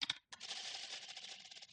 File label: keypress and reset